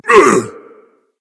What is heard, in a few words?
grunt hit berserk; cuchulainn